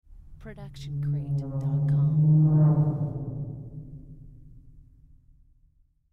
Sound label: stringed drum